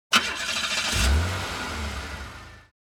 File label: Car Start